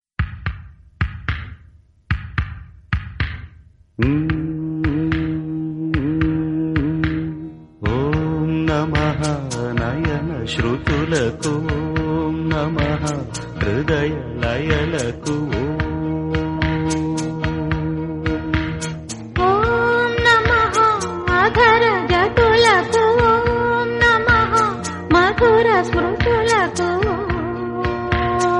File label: Telugu Ringtone; Geethanjali